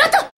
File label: Short Scream; Lewd SFX